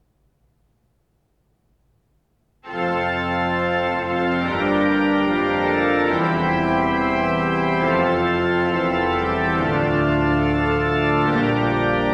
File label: Fanfare, orgelet, Sakshaug kirke